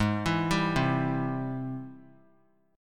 G sharp Augmented Major 7th; arpeggiated; 20,23,22,22; 3C,3F,3A,3D; JGuitar

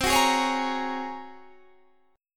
G sharp 11th C

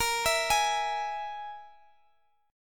Ab Suspended 2nd Sharp 5th Bb

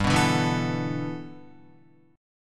G 6th Add 9th G Guitar, chord; G6add9 strummed

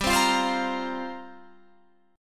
F Suspended 4th G; Fsus4/G; strummed

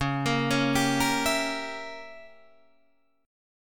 Db Diminished 7th Db Guitar Drop D

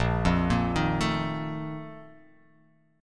A sharp Major 7th Suspended 4th

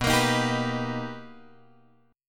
B Augmented Major 7th B, chord; B+M7 Chord {7 X 8 8 8 7} strummed

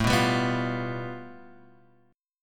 A 7th Suspended 2nd Sharp 5th, Guitar, Open G

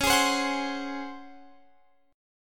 Db Major 7th Suspended 4th, Guitar Open F; DbM7sus4; strummed

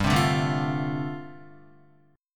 G 7th Suspended 2nd Suspended 4th, Guitar; G7sus2sus4 strummed

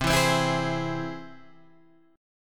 C Augmented down Guitar Open G

